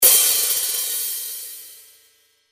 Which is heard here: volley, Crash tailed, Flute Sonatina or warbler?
Crash tailed